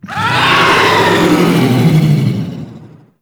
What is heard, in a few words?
Pain; Warframe; Tau; Sentient Dactolyst